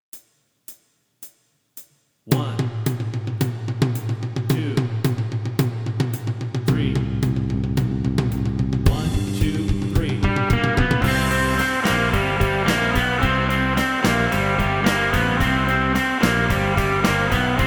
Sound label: medium play along, guitar